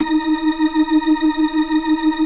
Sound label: FAST VIB, ORGAN, TREMOLO VIB